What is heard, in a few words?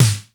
DANCE KITS; 90 sSampleCDs; Crypton - DrumBase5000